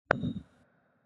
3D Put Down; Martian Chess Audio

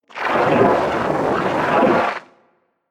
Piece Captured; Martian Chess

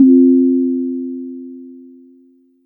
Tongue Drum 2-Tone

